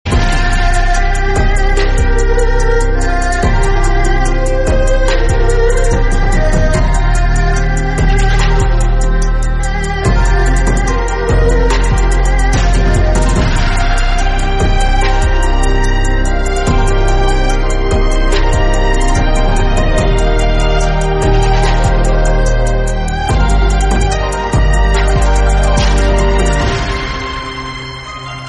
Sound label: theme, instrumental, bgm; vikaram